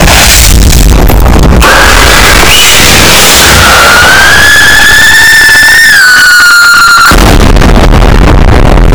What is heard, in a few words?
uuuuuuuuuuuuu boom